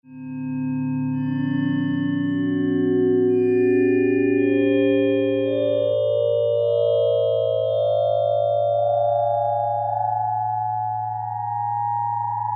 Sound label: The Unity Tone